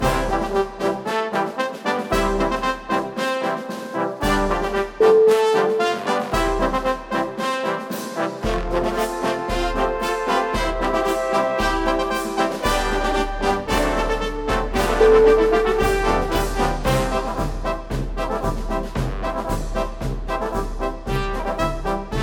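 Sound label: celebrate marching, music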